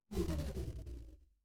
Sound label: retro game enemy death